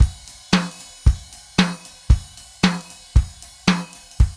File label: DRUMS